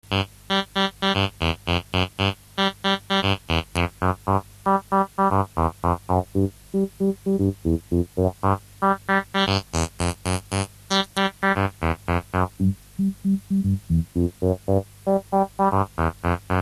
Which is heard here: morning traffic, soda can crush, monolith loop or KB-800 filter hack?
KB-800 filter hack